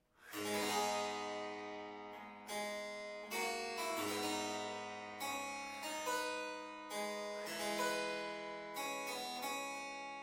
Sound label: Ruckers single 8